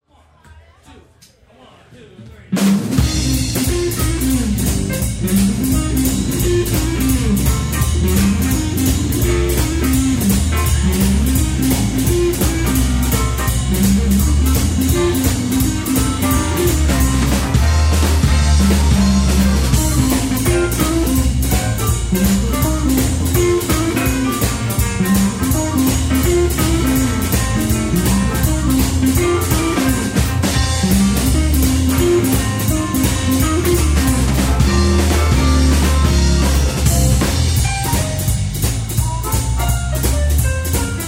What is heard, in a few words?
instrumental